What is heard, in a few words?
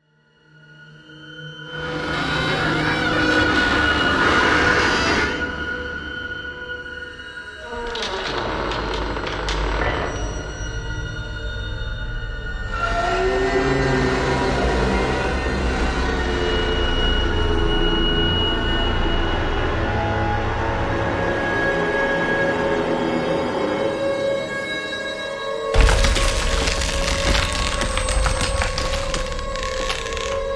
Screeches, screeches and creaks; SFXtools